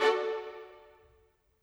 Violins, Orchestral; Sonic Foundry (Sony Creative Software); Orchestral VOL-2; Modern 13

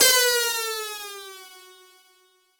U220 FX Set, Drum Machines; Roland U220

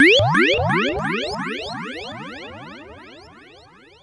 Sci fi

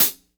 HH, HIHAT HIP; Best Service Dance Mega Drums

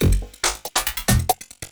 LOOP, 140 BPM, Drum & Bass; Ueberschall; Drum & Bass Resonance

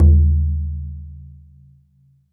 BODHRAN

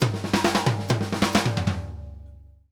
Drumworks SP fills 132; Sampleheads; New York City Drumworks VOL-1

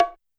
Bongo H; YAMAHA RM1X

